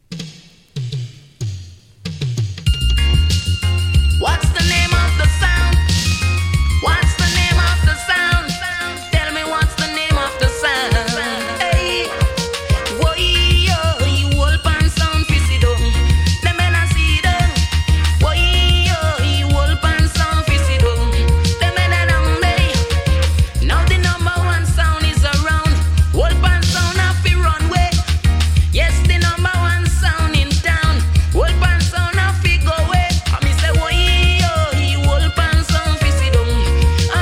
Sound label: old pan sound; vernon maytone